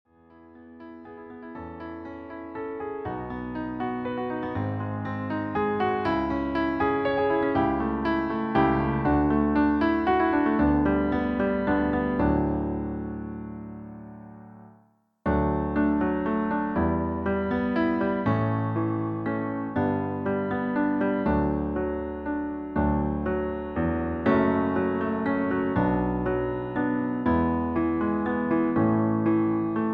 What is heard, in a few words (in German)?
Klavierversion Fluegel Playback Pianoversion